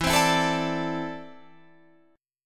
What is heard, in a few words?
E 7th Suspended 4th Sharp 5th, Guitar, Open F; E7sus4#5; strummed